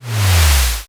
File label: whoosh deep